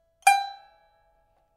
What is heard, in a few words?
pipa; chuYinKouXian